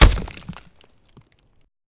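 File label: Bullet Light Ballistic Dirt impact; Titanfall 2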